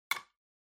Wall Outlet Cover Key Insert

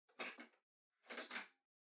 Portable Stereo Far Tape Open Eject

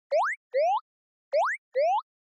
Anime Motion Phrase

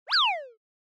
Motion Pop

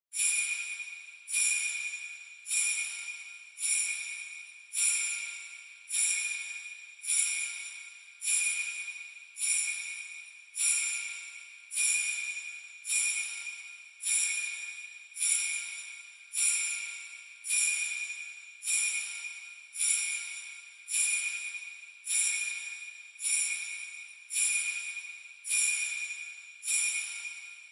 Sleigh Bells Reverb Twist Gentle

